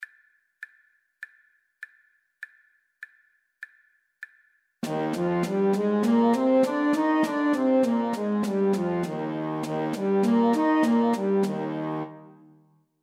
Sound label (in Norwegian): Ess-dur natura fort